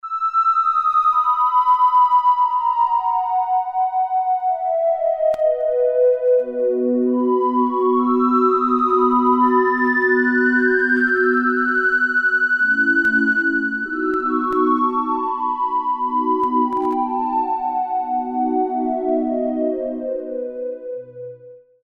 sinusoidal, Roland Alpha Juno-2; Factory A

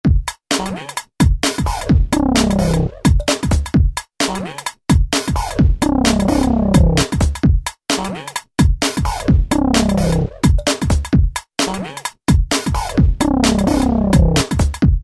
Two Step, Korg ES-1; Factory Patterns

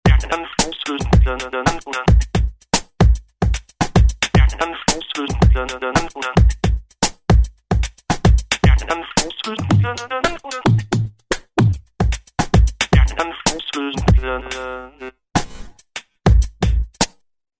Phoney Beat, Roland V-Synth; Factory Patches

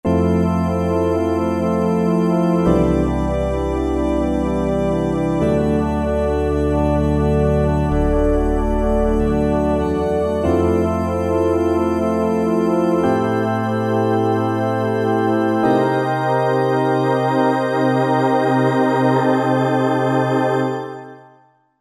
VS Organbell, Roland SR-JV80-04 Vintage Synth

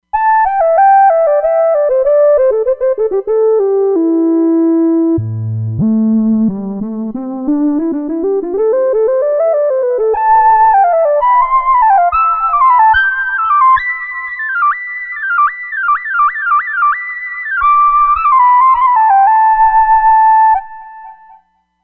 Modular Lead, Roland Fantom XR

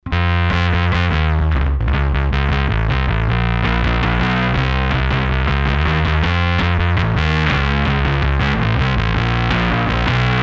ATTACK FUZZ, Zoom 506 II

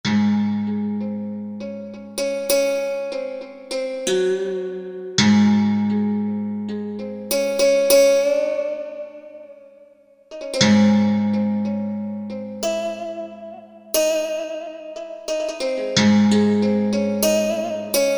Santur, Korg MSC-6S Fretted Insts; Fretted Insts 1

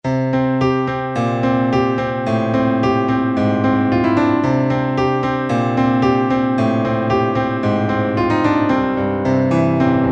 Piano2 16', Korg T3

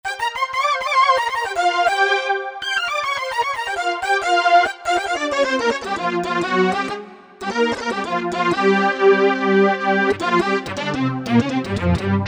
Solo Synth, Korg MSC-1S; Korg MSC-1S Variety

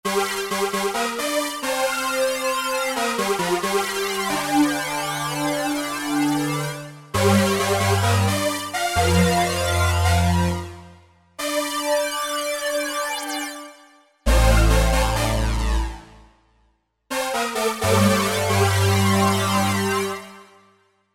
SYNTH TEXTURES Brass; Coronados Brass; Apple GarageBand 2

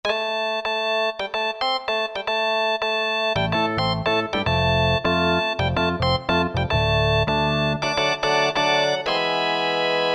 zig zag organ; Roland SRX-05 Supreme Dance

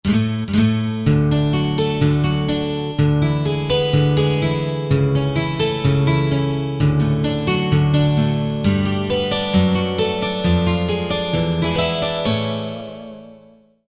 Witty Guitar, Roland JD-990; Factory Patches

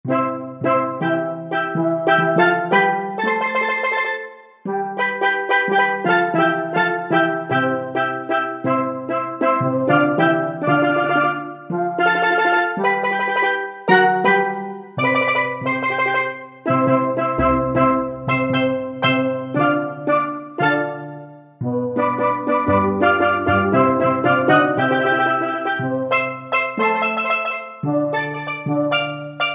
Steel Drums, Roland SC-50 Sound Canvas; Factory sounds

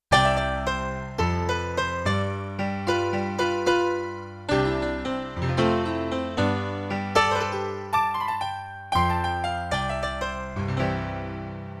A Piano & Stereo EP; Yamaha TX16W; Jazz Performance; Jazz Performance 2

